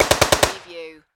Submachine Gun Sterling

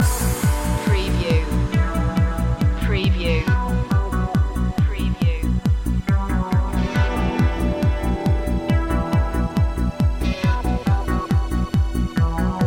accelerator loop

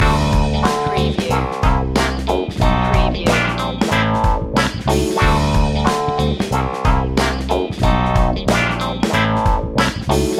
funky punky loop

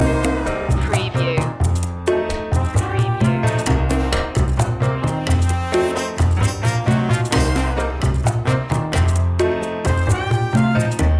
jazzy opener

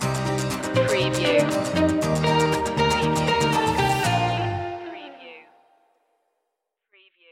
full sails stinger

